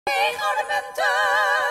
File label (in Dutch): twee gouden munten